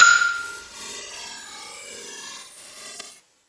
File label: mallet organ